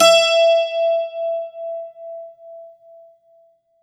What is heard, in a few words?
bouzouki; Best Service ProSamples vol.52 - World Instruments 2; AKAI